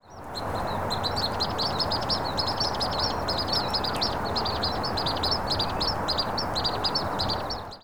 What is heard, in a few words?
Pygmy Nuthatch; Genesee Mtn Park